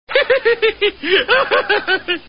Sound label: laugh; homer; simpsons